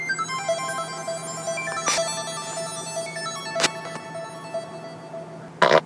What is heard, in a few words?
Ambient Fart Junk